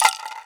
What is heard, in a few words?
RATTLE, INDIAN PERC